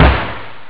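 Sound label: snare drum; ST-XX Amiga Soundtracker Sample Packs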